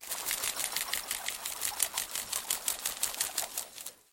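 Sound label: metal cable sounds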